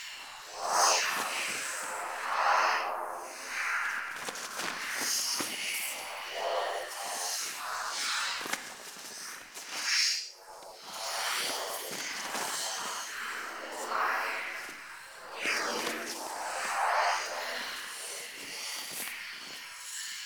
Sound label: Move Loop; Wisp